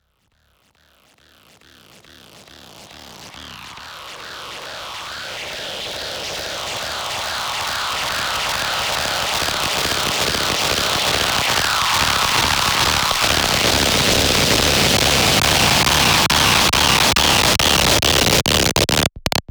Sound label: Distortion Riser; fx